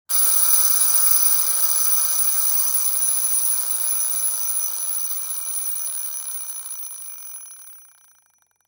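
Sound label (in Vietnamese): đồng hồ báo thức cơ khí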